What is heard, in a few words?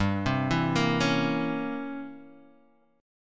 C 7th Flat 9th G